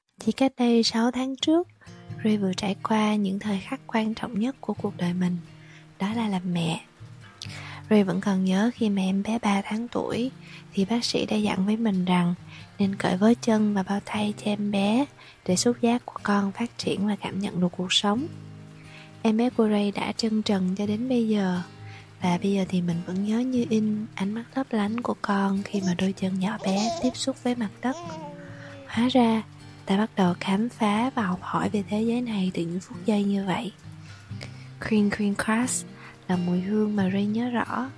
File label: Tieng Viet